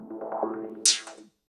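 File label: PERCUSSION ELECTRO 140, Techno Drum; Techno2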